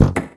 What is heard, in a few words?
Fall Down